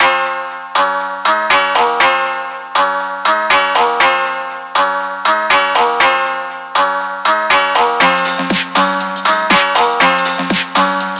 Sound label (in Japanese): ダーク Phonk